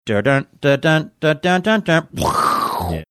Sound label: imitating jack in box exploding